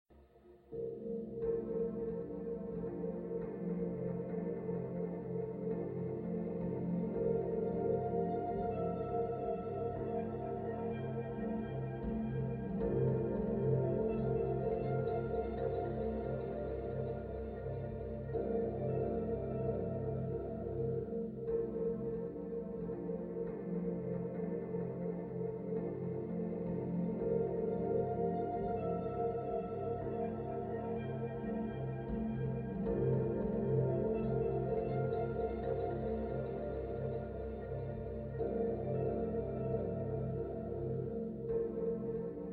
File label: ambient music; ascendance